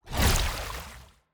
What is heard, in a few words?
Water Sphere Attack; TANK; 3Souls